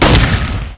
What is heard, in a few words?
m 16 single